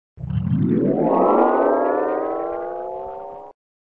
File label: Sound SFX, flipper